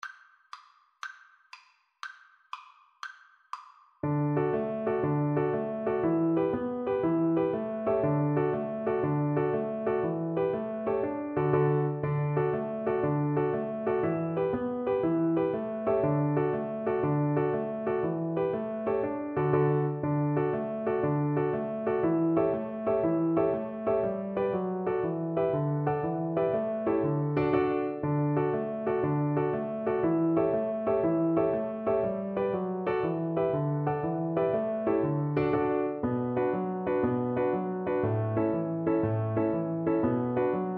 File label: mandolin